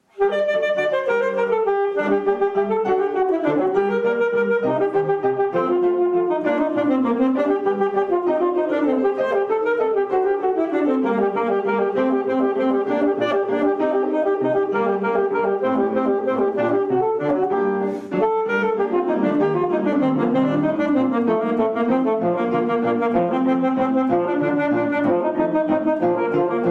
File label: Sonata; Solo Accompaniments; Alto Sax; Eccles - Sonata